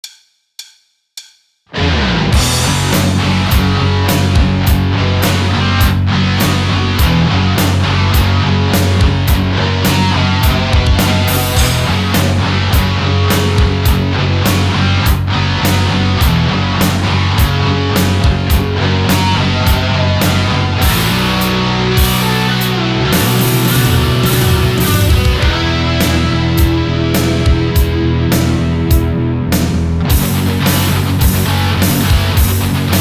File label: Crunch